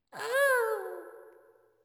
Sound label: Wail; GGJ 2022